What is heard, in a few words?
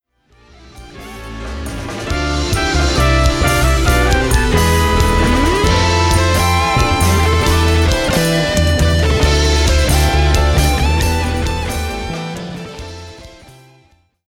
trolley instr.